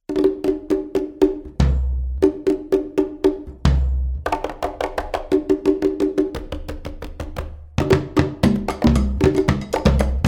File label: Conga Habanera